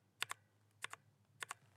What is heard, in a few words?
red switch keystrokes